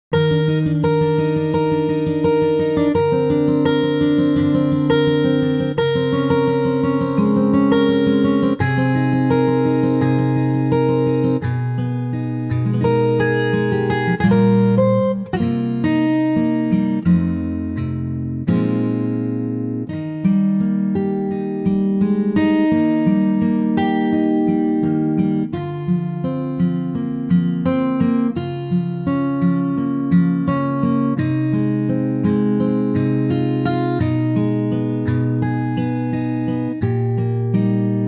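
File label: Eb Acoustic Guitar; key - Eb - vocal range - D to Eb; Gorgeous acoustic guitar arrangement; Stays in tempo all the way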